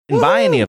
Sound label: woohoo